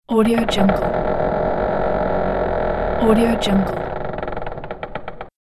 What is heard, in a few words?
door creaking; dakochan